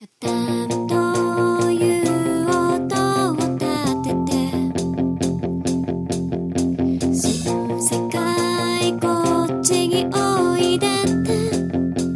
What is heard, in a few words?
music